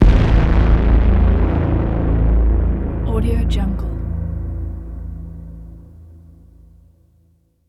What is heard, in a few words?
braaam strike explosing concrete distoried crackle melt; esmteam